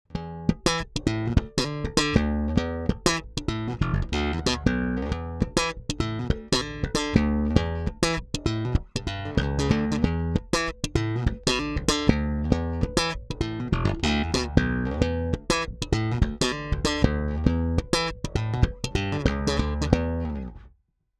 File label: active neutral slap